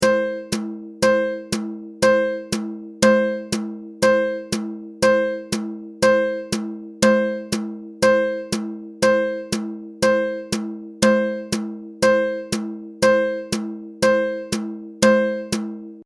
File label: 8th Notes Example; traditional notation